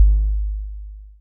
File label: kick, Heartbeats